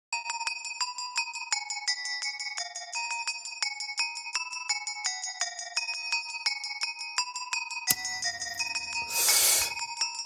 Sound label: Tiktok Trap